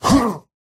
pain small; Slork

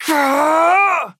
pain big; Trapper

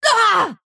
pain big

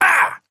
effort melee small; Trapper

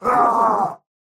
pain big; Slork

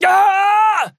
pain big; Trapper

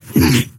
efforts

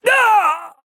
pain death; Trapper

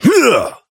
effort dash; Operative

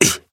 pain small

